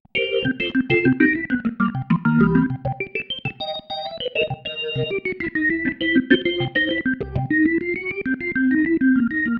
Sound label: Pattern, Organ